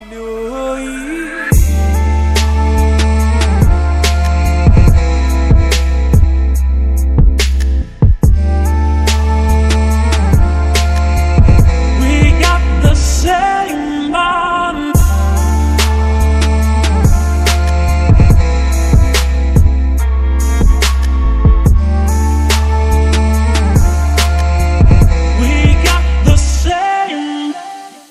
ringtone chill trap

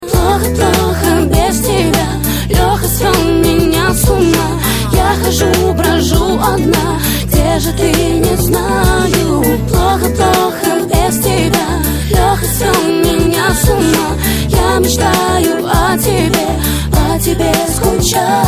ringtone pop version; imennye ringtony

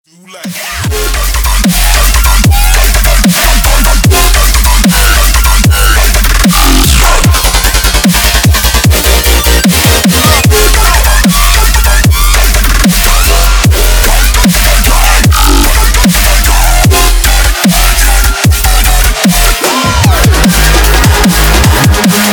dubstep

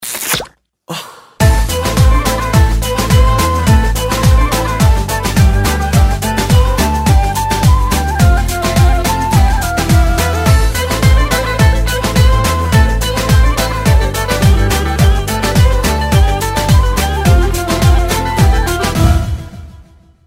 turkish pop ringtone

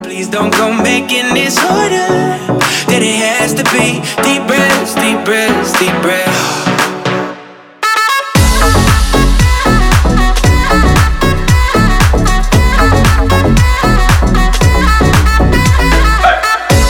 ringtone deep breaths

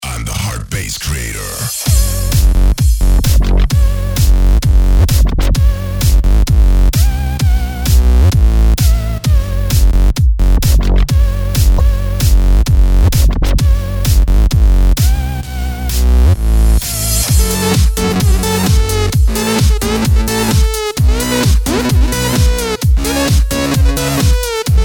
the melody i bass

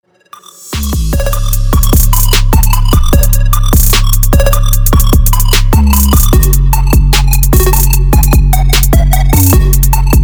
trap

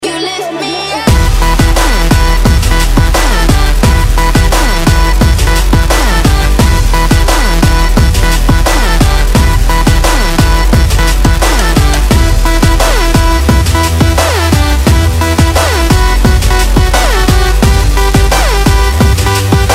dubstep remix

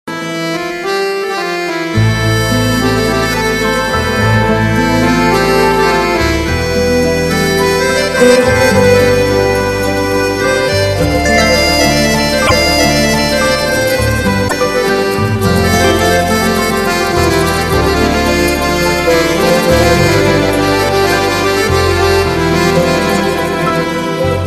nice music tone

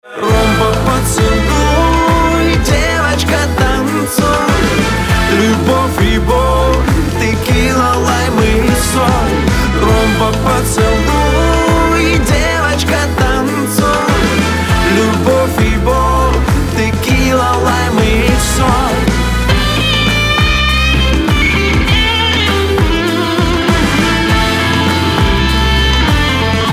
rumba

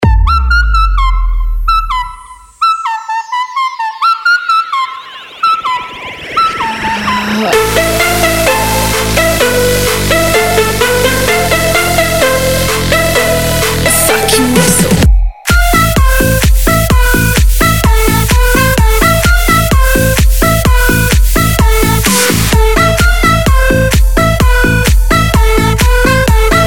whistle bounce

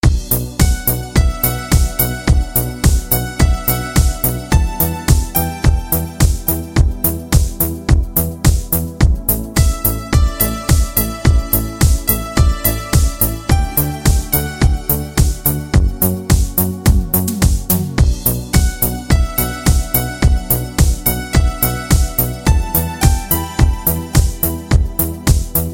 great melody

